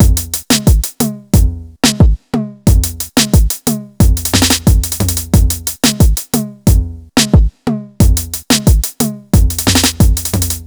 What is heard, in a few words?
loop 90 Bpm, REGGAE; KITZINHO LOOP MAIS SAMPLES REGGAE 90Bpm #1